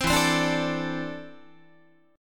E 7th Suspended 4th Sharp 5th E down Guitar 4A 4E 5A 5D 5G sharp 6C sharp; strummed